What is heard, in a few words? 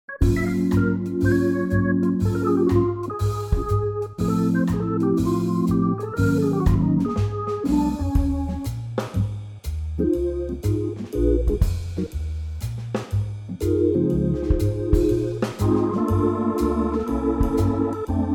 Db organ; organ trio; vocal range - Db to Eb; Super-cool Hammond organ trio arrangement of this great old standard.